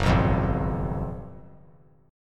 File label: Gb Major 7th Suspended 2nd Suspended 4th; GbM7sus2sus4 strummed; JGuitar